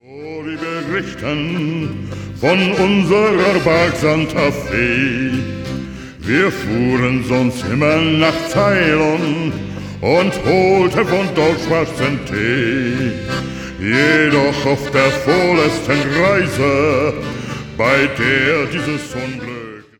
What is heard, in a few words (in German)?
Shanty Chor